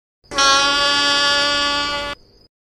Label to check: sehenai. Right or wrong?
Right